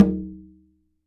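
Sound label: Percussion Conga; Polyend; Simulacrum Sounds; 1 Shots; Acoustic and Electric; Drums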